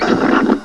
splat; Snail Race 1.0

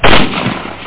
gunfire; A Matter of Time

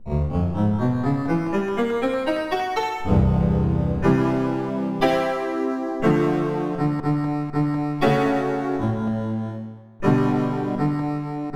Honky Tonk

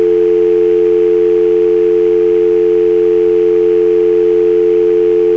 Dial Tone; PhoneSoundz 1.0; Phreaking; Wardialers

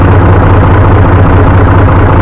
diesel

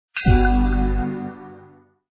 Level Completed; smashing

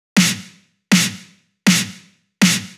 KICK 160, Drum Bass; eJay

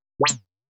blip

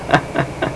sinister; People; Sound Library 2000; Wayzata Technology

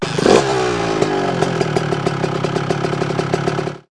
Offroad Motorcycle; BOOM! - Wild and Wacky Sound Software; Outdoors & Nature

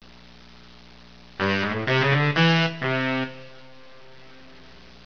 sax

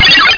computer; Xenosoft 2 (Game collection)(1994); ALIEN

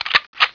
weap grab; Outlaws; PC Gamer 43

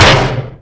pipe hittin; weapons; melee; kingpin

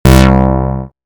rez bass

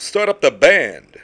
card start up band; Deathground 1.2 Demo; New York

